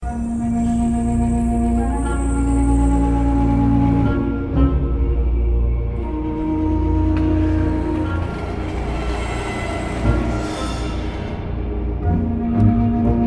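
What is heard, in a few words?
Dwarven Instrumental Ambience - Mine; shieldwall chronicles swords of the north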